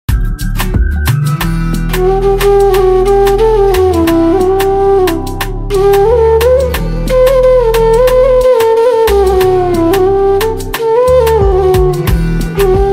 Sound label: flute instrumental ringtone